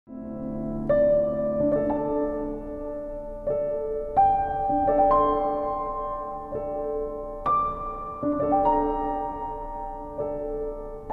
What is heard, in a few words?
Piano Strings